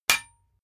sword metal hit

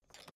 suitcase latch lock spring creak

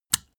step barefoot wet hard

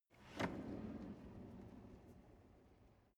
drawer medium open draw long

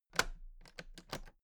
toolbox latches open heavy

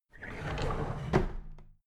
drawer big squeak close regular

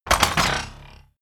sci-fi electromechanics expand evil

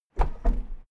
car lift down short

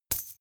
chain mail impact hard

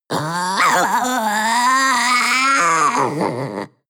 panther young vocal yowl

